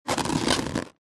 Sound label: Tap Inflatables